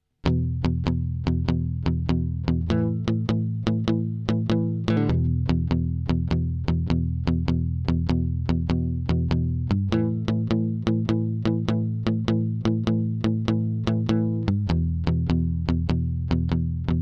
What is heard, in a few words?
blues prog